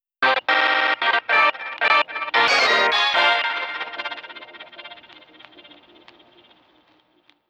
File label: Basketball back logo only; WLS